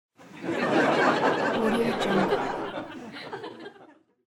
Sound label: audience laughing